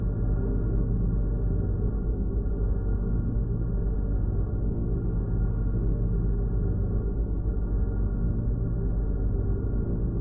Portal; KardaMahal Underground Temple

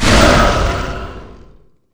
General Appear

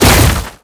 Havoc Spear Hit; MG Renewal; MUDevs S20.1-3

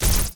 move; Crypos; Karutan

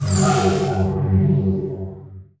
Ice Boom Circle; Nix skill; MUDevs S20.1-3

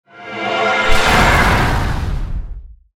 summoner skill explosion; MUDevs S20.1-3

